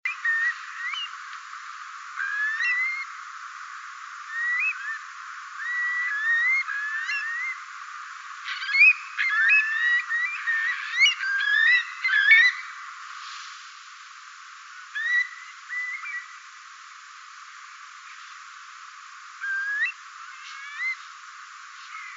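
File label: Eurasian curlew voice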